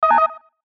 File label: BLIP DIGITAL DELAY SYNTH; Beeps; Multimedia